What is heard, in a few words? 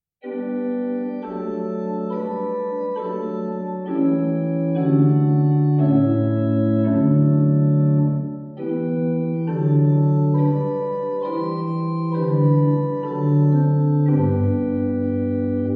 Prengel, pipe organ